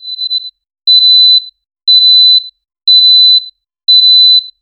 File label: a phone that rings twice; communication